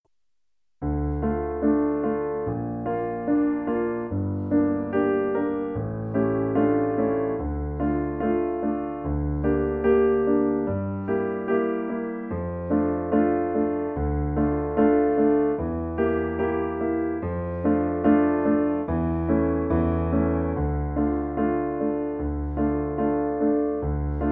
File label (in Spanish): piano